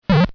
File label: bump